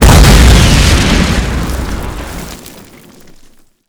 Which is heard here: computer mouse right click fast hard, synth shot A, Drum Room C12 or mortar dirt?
mortar dirt